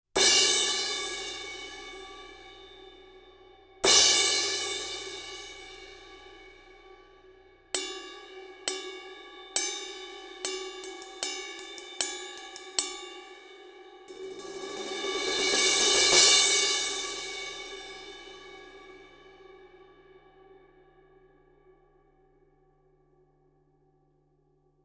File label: Cymb Crash Bell